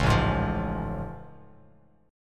A Augmented Major 7th A Bass Standard; strummed; JGuitar

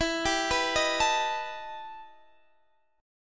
E Minor Major 11th, Guitar Half Step Down

